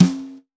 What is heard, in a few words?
Brass Brush, snare; Drums; Ghosthack - Free Snares 2023; Blk Brass